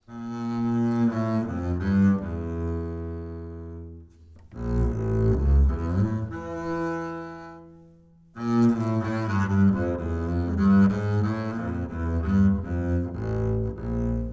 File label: Ac Bass 25cm C Bowed High Mid